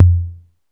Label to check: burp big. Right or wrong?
Wrong